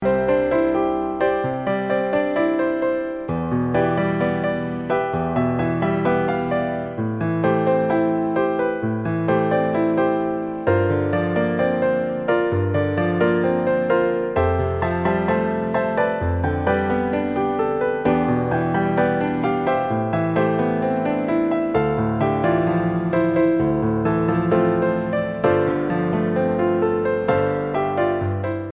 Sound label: piano ringtone